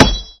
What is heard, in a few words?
knife hit; weapons